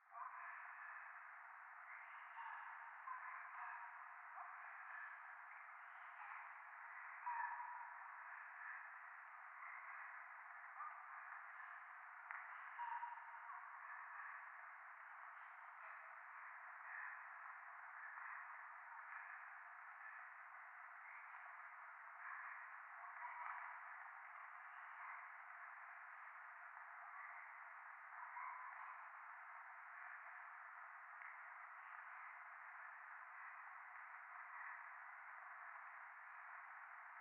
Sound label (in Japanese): 廃墟 右耳密着処女喘ぎ 雨